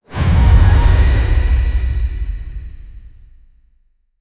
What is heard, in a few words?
intense cinematic, orchestral hit